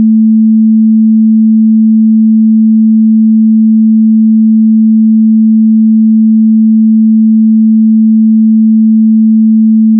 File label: sound color Button